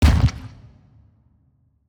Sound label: organs; Thalamus; CARRIER